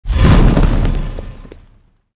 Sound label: wall move; movers